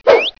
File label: throw; FOXBEAR; DIRECTX